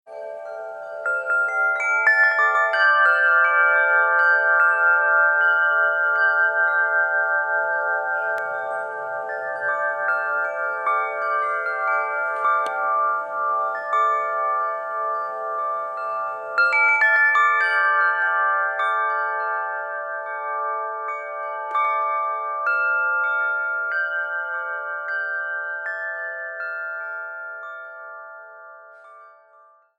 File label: Water